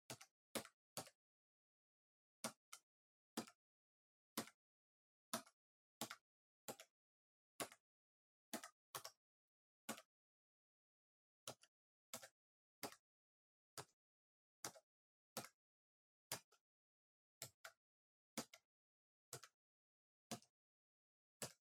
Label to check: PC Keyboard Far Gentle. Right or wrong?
Right